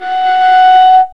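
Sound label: strings viola, chamber harm; Viola Solo; Vla Harmonx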